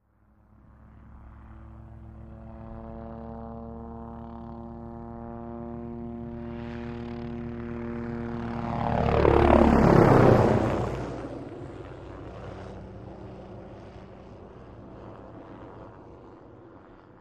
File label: OH6 Loach Dive By Away, little bird